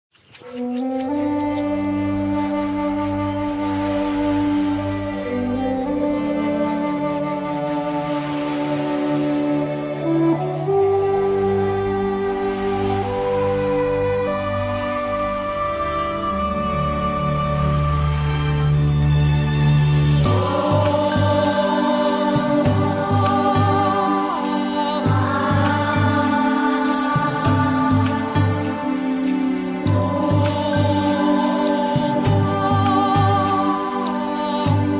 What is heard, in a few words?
Om Ah Hum